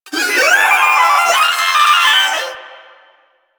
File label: Proto Infested Volatile Runner Taunt Scream; Enemies; Abilities; Warframe